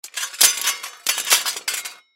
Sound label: Swords Drop Multiple On Ground Cement; Warfare; Swords (Sound Effects:Combat); Blastwave